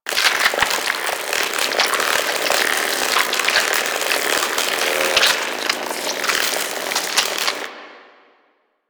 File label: Shield Projectile Loop; DE; Warframe; Garuda